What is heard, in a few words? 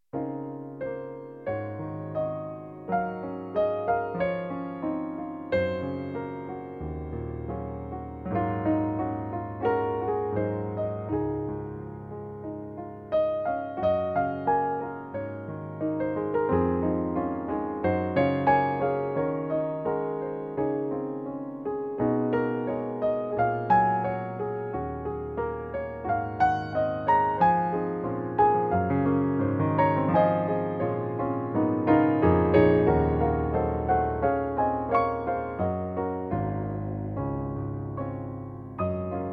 Crystal Wind